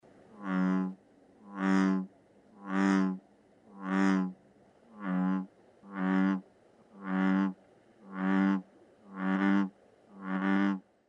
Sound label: Bullfrog; A Description of Sound and Its Qualities